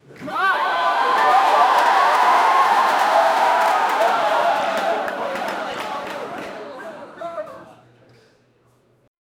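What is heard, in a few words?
hyped audience reaction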